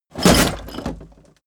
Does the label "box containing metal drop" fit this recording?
Yes